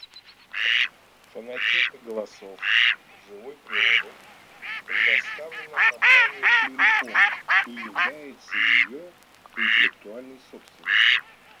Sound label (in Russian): Кряква Germano; plurifon